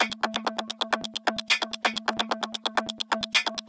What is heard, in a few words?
130 Dance Perc Fx; Pro Tools Essential Loops; Dance DJ; Percussion